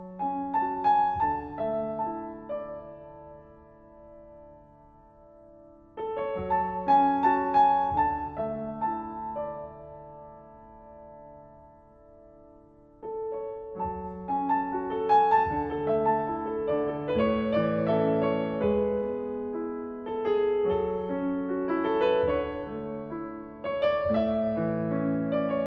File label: slow piano music